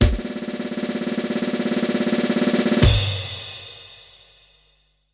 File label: drumroll